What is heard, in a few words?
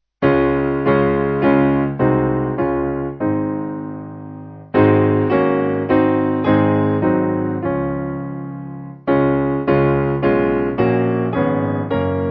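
Piano, backing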